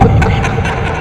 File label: Jumpscare; Partygoer; Backrooms 2D